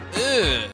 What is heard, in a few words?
ugh